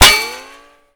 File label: impact metal; Modern Warfare